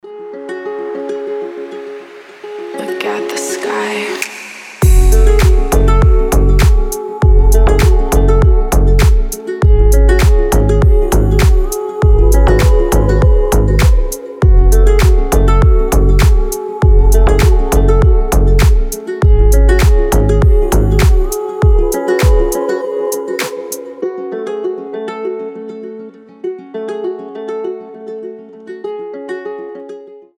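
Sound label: ringtone magic music for alarm clock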